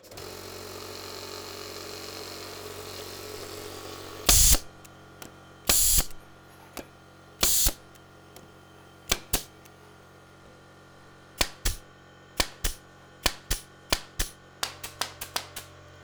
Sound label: Pneumatic Nailer